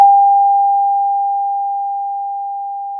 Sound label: pop, Sound of a school bell